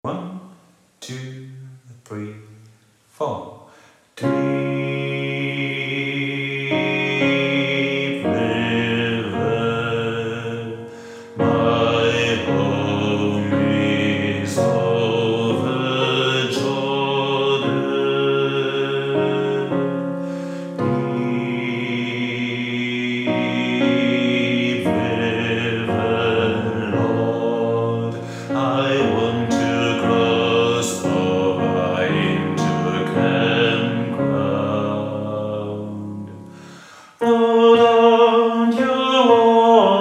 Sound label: Deep River Alt; Popchor